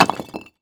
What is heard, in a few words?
brick drop